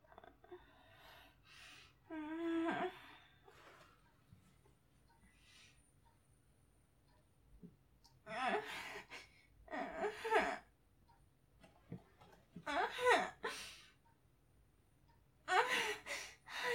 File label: Intense Climax, whimpers, moans, heaving breathing, a lot of noise; no words; F4A; straight fapping; Erotic audio